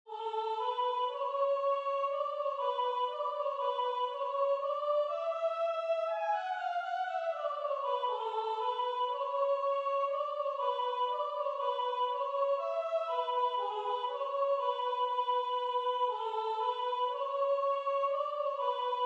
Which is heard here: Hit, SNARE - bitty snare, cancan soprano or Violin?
cancan soprano